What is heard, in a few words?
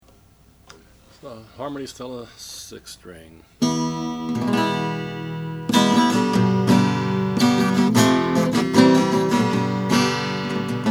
harmony stella h1105 sunburst; 1945